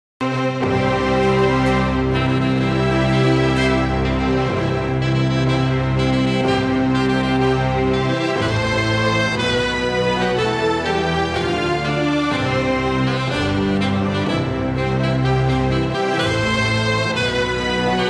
marcha nupicial ao vivo

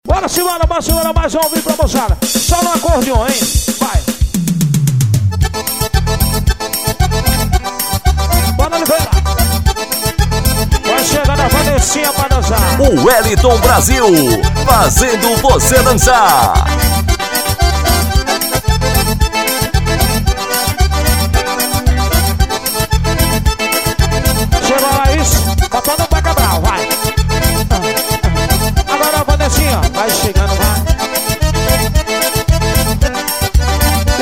pizero

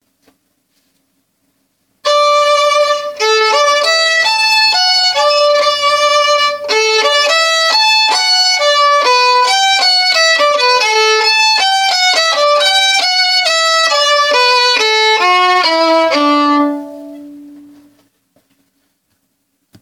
solo violino

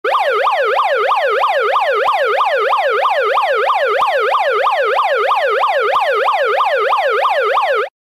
vinheta sirene de policia

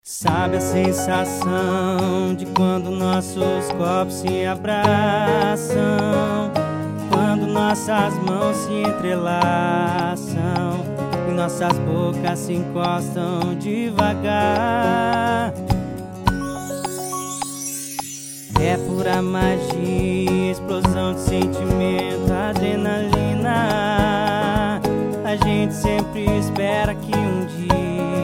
sanfona gravação teclado piano; maycon gravações

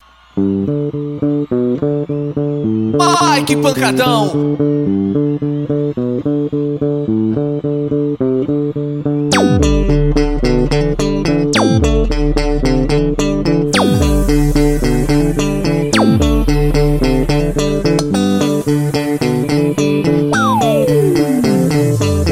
abertura ao vivo

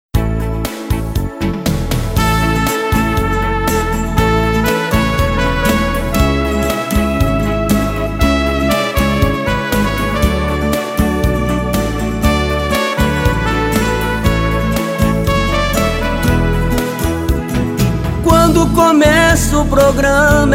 teclados

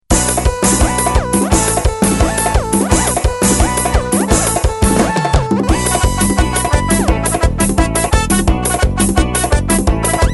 ritmos eletrico bahia

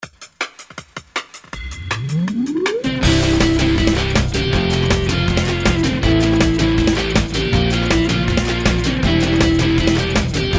vinheta bomba

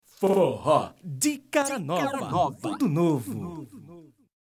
vinheta

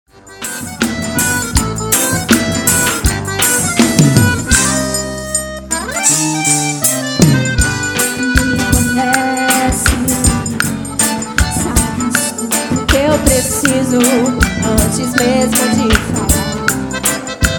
forró ao vivo; concha acustica jacobina ba

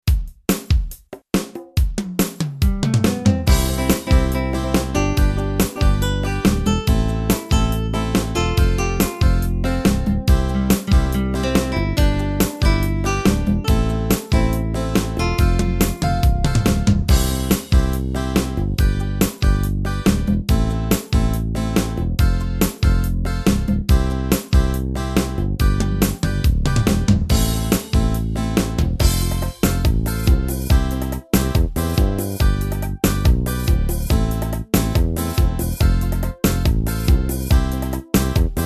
ritmos yamaha balada sertanejo universitario

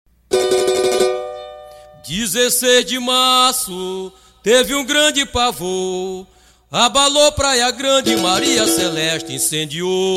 toada incidental

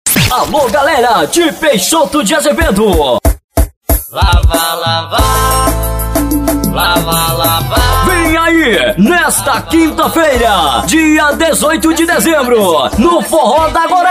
forrozão

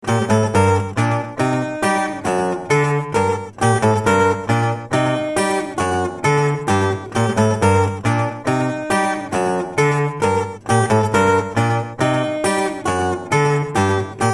bourree em sol menor p violao

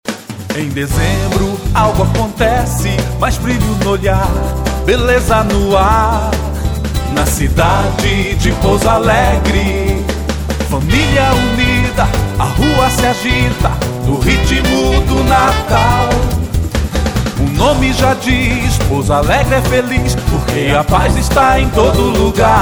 jingle

